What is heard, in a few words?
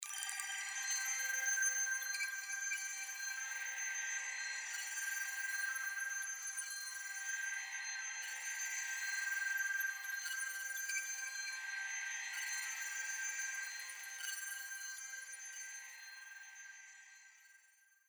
Future Bass Chord Pad Texture